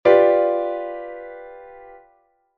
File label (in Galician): fa-sol-si-re